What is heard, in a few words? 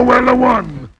"WAHWAHWAH", VOCAL